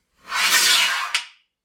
Metal Slide

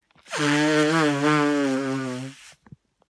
Nose Blow

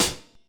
Hihat

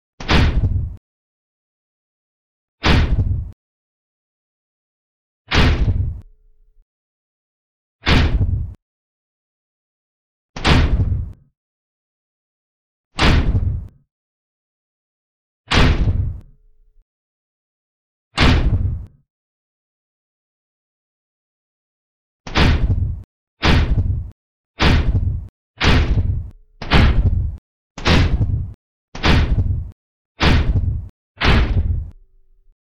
Heavy Robot Footstep Sound

